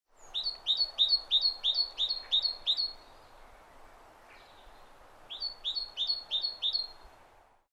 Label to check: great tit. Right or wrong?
Right